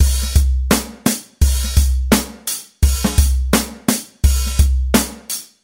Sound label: Boom Bap Outtro; Zoom R20